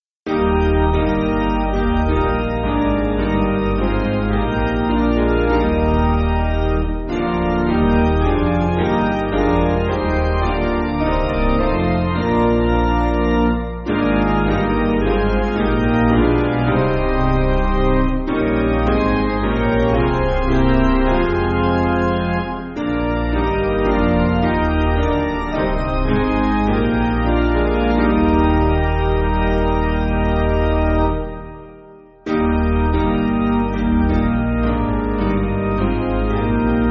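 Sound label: Organ Piano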